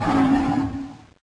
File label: Noble Roar; pokemon reborn e19 2022